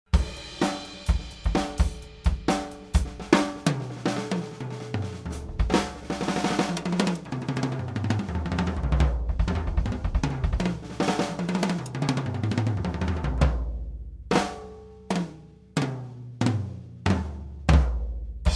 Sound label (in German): MKH40 OH Kick Snare Toms Becken; MKH40- mit Kick- und Snare-Stützmikros- Toms und Becken - flat; Glyn-Johns